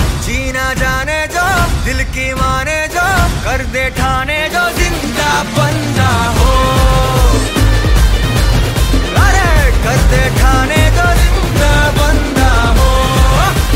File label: New Song; Deepika Padukon